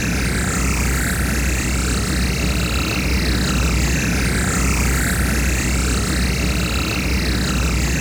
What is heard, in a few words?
mega moth laser; temple; battle